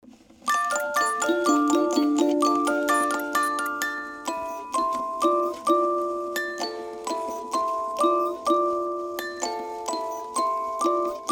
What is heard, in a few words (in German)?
Old Mc Donald rückwärts, saxophon